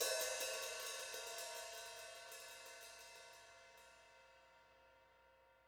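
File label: hi-hat Custom Mostly Open Soft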